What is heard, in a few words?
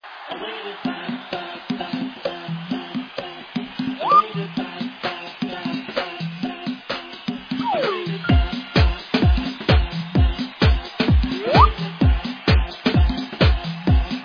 Dance Song